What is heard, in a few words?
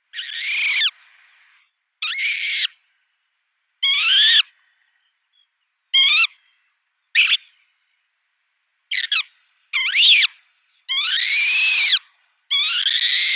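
little auk voice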